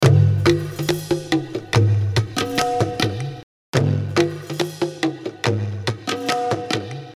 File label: Edgy Tube